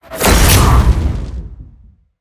Monster; Zenless Zone Zero

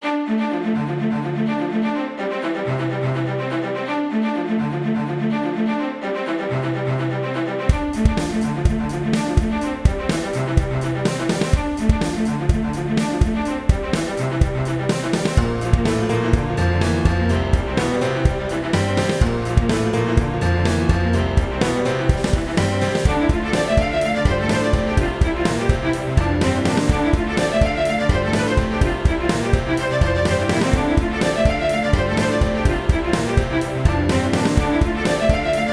Orchestra

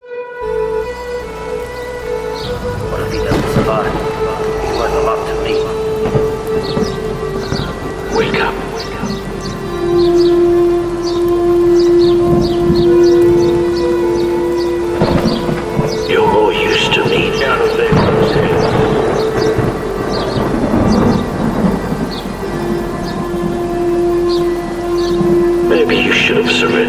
Natural Glitch, Nature Sounds

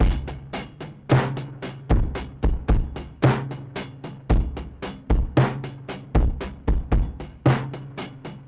filturd drums; 113BPM; Pony Fan Music Remix Stems

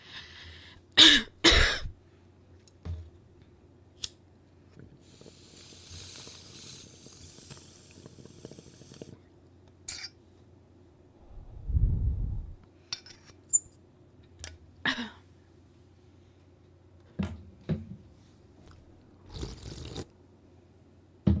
Goth Stoner Girl Rips Bong, Bong sounds; Breathing; drinking; smoking; Drinks Baja Blast; F4A

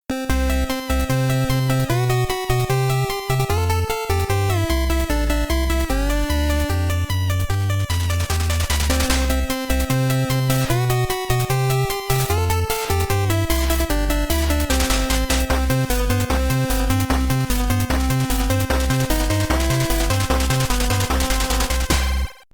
Hop Skip and Jump (8-Bit); Pony Music Archive

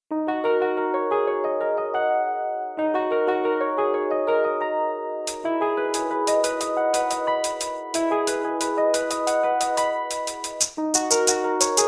groove

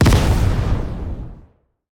Monster; Zenless Zone Zero

